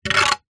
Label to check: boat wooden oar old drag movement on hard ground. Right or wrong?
Right